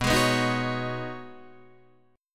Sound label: B 6th Add 9th B Guitar Open D, chord